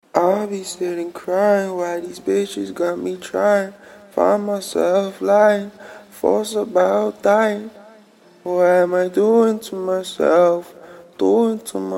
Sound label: indie, 120, Bbm, acapella